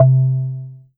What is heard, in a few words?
Ensoniq ESQ-1 Marimba C3